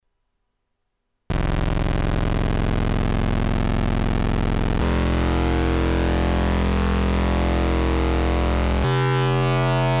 Korg Volca Keys Uni Ring

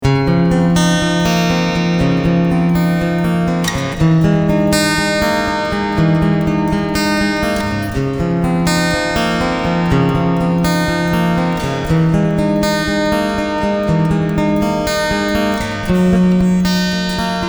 Plate

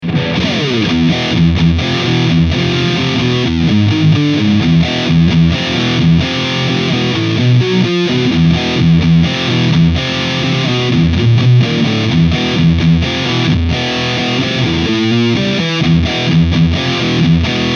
Peavey Invective MH Full Gain SCOOPED

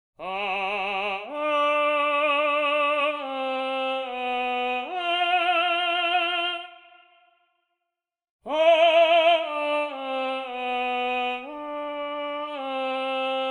Opera Tenor Legato; Voices Of Opera

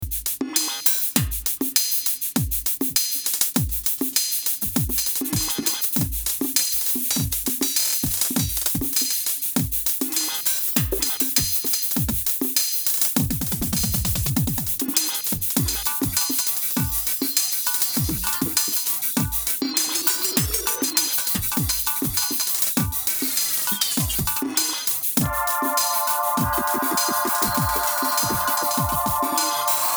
Eventide H3000 Stutter Drums Synths

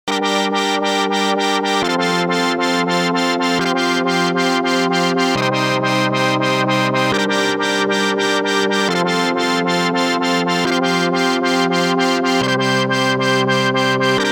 LFO Dry 2 Wet

